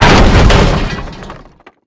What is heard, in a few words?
metal wood; World of Tanks Blitz